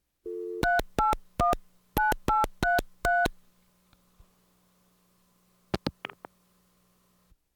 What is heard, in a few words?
Household Phones